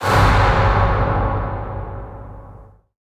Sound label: TIMESTOP